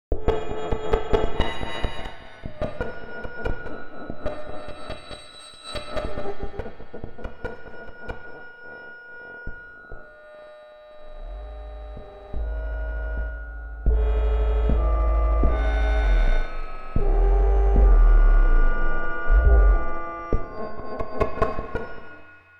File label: Creepy